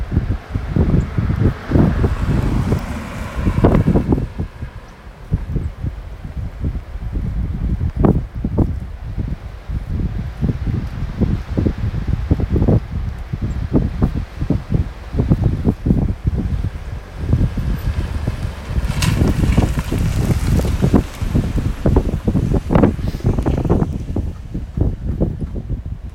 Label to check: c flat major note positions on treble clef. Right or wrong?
Wrong